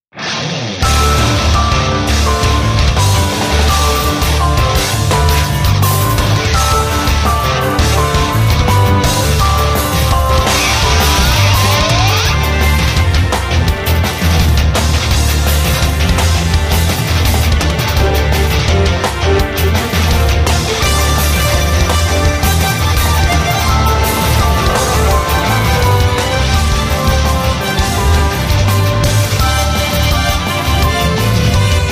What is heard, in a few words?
Battle BGM